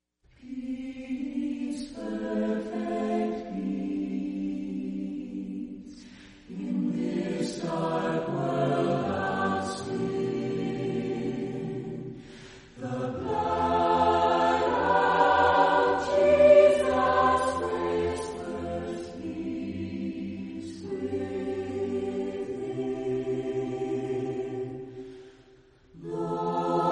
Chorale, Voices in Praise acappella

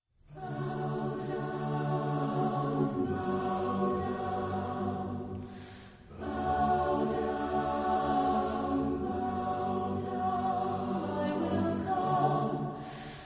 Chorale, Voices in Praise acappella

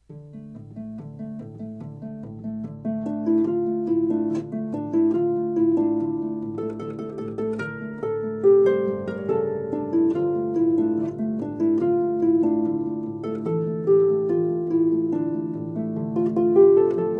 Harp

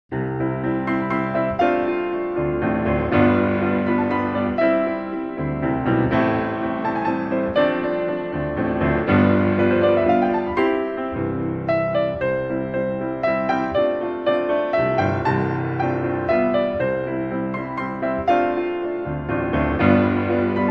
Sacred Arrangements For Piano

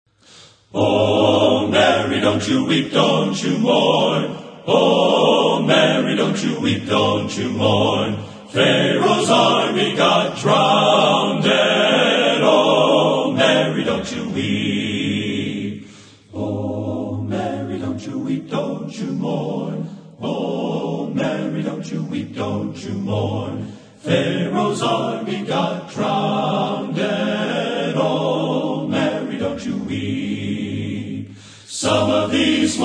Men's Choir

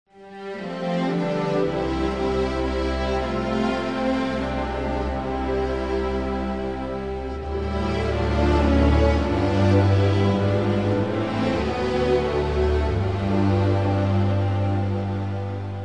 Orchestra Panpipes, Moment By Moment (Medley)

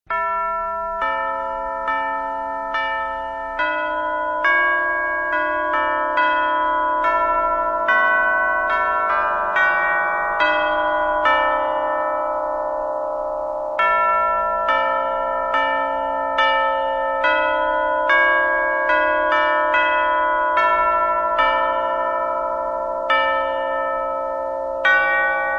Cathedral Organ And Chimes

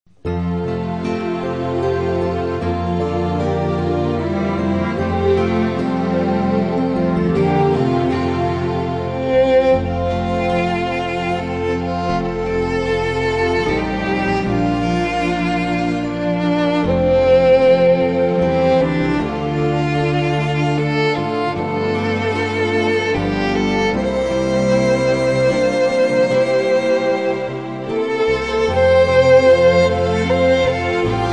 Instrumental Praise

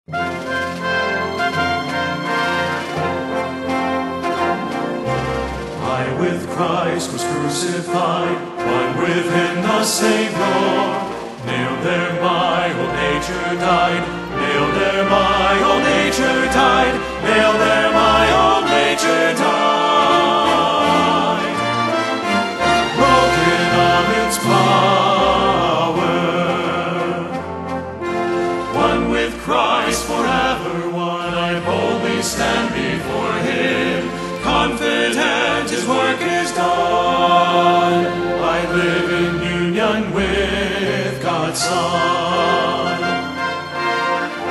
Choir And Orchestra

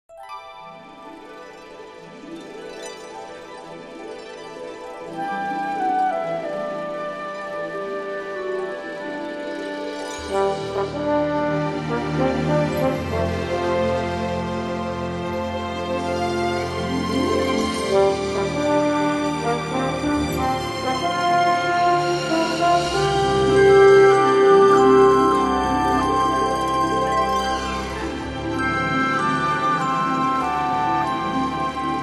Christmas Meditations for Orchestra

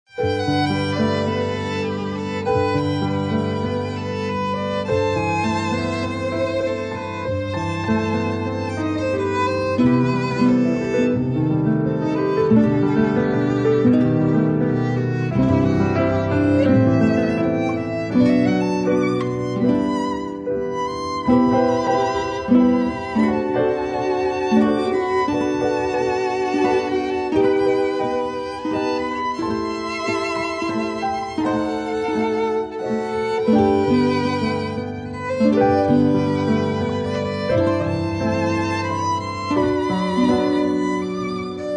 Strings

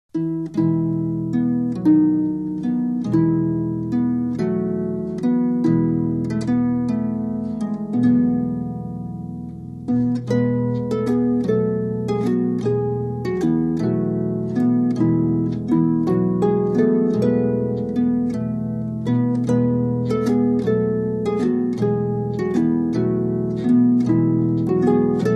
Harp

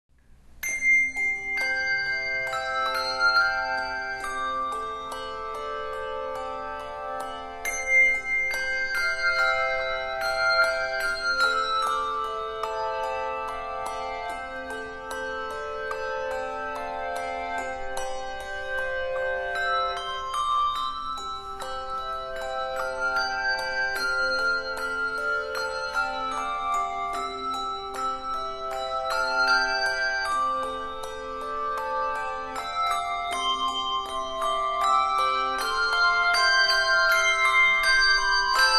Handbell Choir; Pillsbury Baptist Bible College

